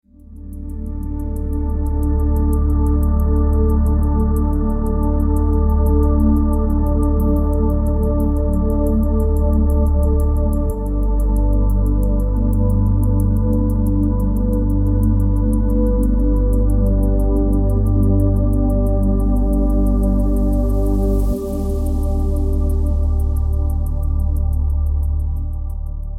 ambient dreamscape

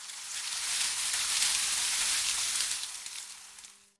tropical leaf rain

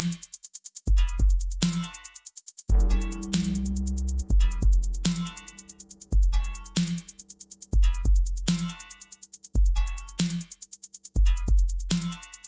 subterranean drive

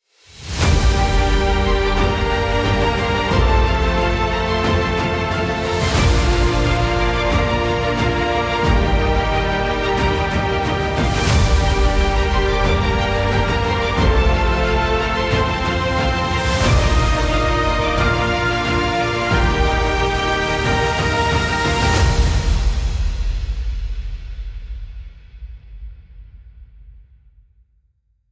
fantasy kingdom ascent

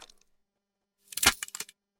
wooden splinter snap

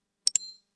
glassy ui click

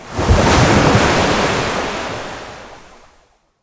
crashing ocean rock

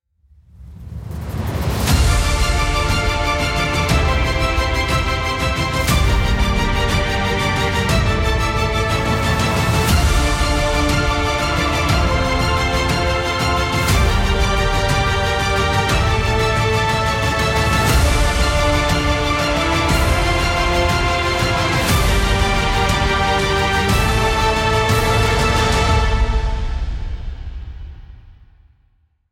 epic grandeur score

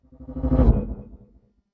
ethereal hover whoosh

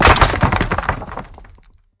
crumbling stone collapse

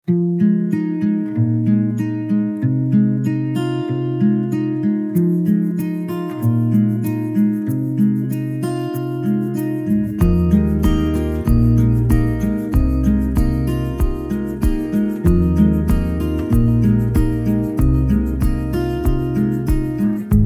hopeful guitar theme

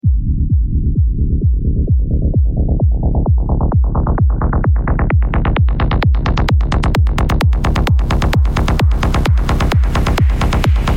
dark electro pulse

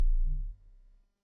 mechanical button press

